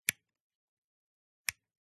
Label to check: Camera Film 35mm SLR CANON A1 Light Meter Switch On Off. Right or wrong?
Right